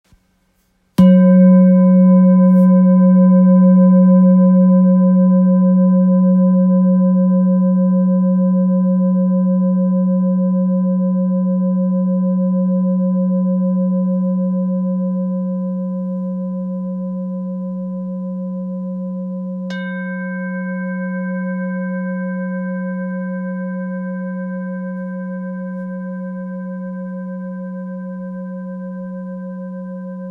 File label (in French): Bol thick fa diese